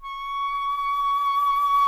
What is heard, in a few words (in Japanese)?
fue